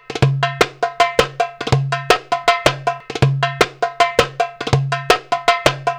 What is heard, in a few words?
PERC LP, Arabian Traditions; USB Soundscan vol.43; AKAI